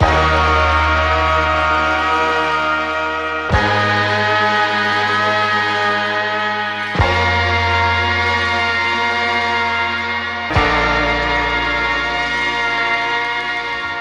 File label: horns, Phonky loops